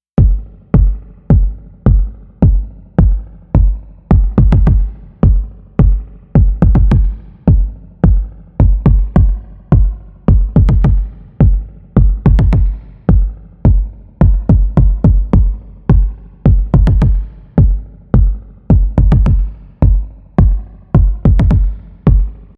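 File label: MOOG MAVIS KICK with Stereo Spring Reverb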